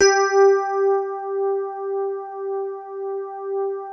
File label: DIGI RHODES; Sound Gallery - Charged